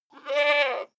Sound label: goat baa male